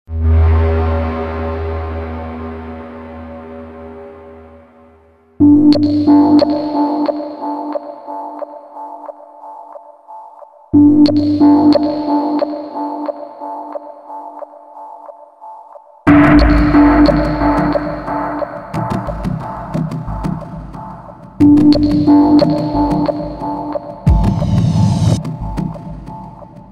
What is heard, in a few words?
free beat